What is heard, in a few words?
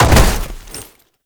Land Heavy Snow; Movement; Warframe; Uriel